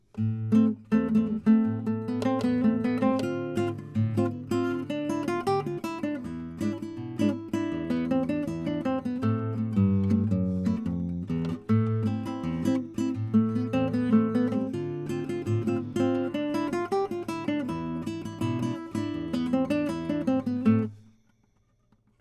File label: Fingerpicking Bossa Nova Improv